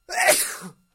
Sneeze

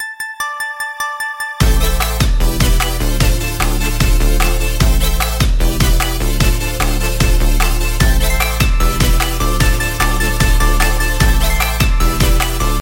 Fast Upbeat

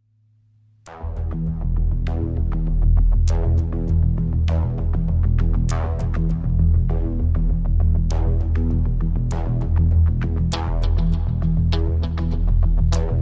Jazz

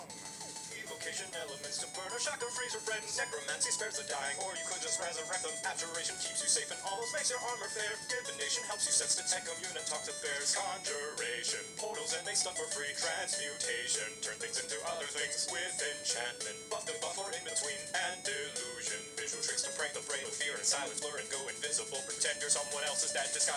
Wizard song

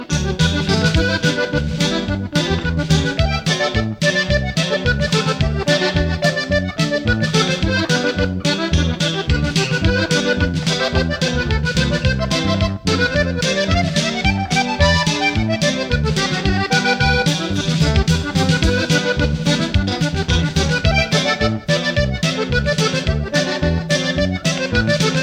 Polka

